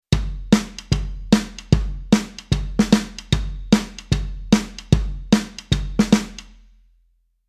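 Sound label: Rhythm Country pattern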